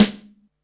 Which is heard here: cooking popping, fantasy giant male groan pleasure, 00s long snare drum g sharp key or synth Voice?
00s long snare drum g sharp key